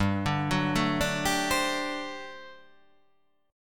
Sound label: G Major 7th Suspended 2nd Suspended 4th G down